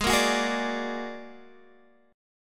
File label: A sharp Augmented 7th G sharp, guitar